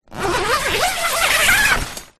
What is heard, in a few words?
tent zipper; foley